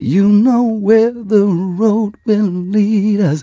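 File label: male 127 mel e-flat, Vocals; Mutekki Media - Diamond Vocals 2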